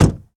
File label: Truck Durango Door Close; Trucks